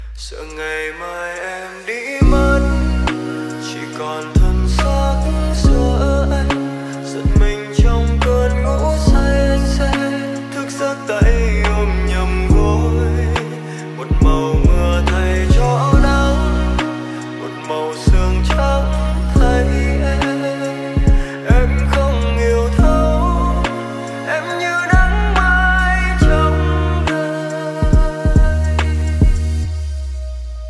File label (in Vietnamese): Nhac Chuong Lofi